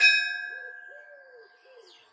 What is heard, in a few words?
bells, old school ifield